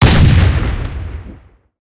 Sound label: ns45 single shot, guns; War Thunder